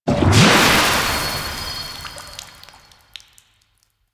Fishing Water Exit; Duviri Maw Fishing

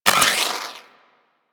Wall Hand Slide; Footsteps; Warframe; Tenno; Wall Run

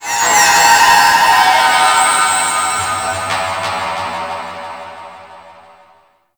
Sentient Condrix Call; Squad Link

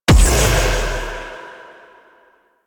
sidearm proj explosion, weapons; Warframe; Tenno; Wraith Sidearm

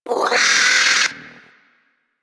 Hyena Micro Vocal Angry; Warframe

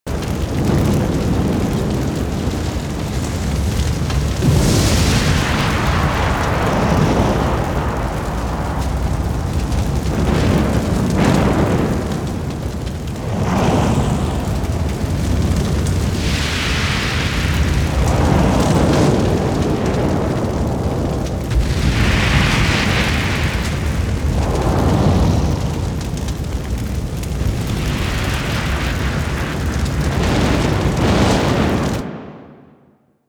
Destroyed Throne Room Fire Blaze Loop; Warframe; Quests; TheNewWar; Ambience

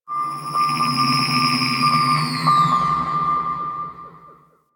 Corpus Ship Exterior Satellites, Ambience; Warframe; Corpus Ship Remaster

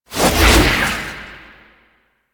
Tied Up Cast; Warframe; Warframes; Khora

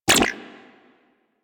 Shield Impact; Warframe; Warframes; Volt

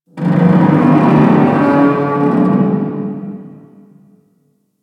Infested Distant Metal Groan; Warframe; Corpus Infested

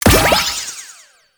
Bonus Objective Success; Warframe; Nokko Colony

